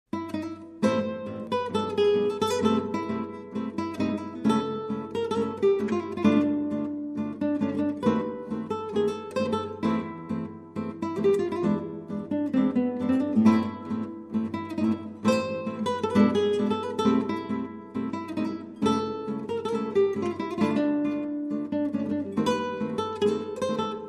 Tango

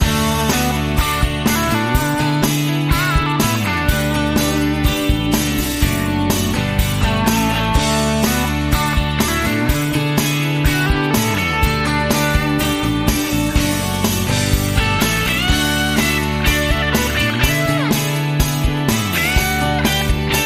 Guitar Central

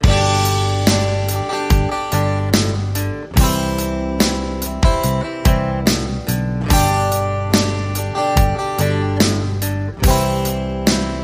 Rock Ballad

